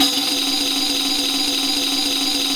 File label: Rek Roll; Roland BK-5; Percussion